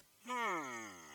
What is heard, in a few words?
hmm